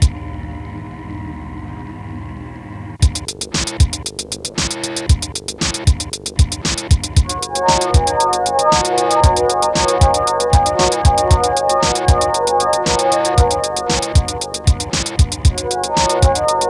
equinox break

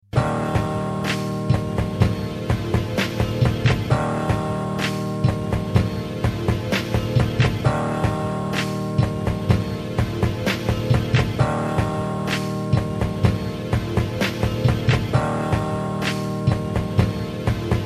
beat sketch

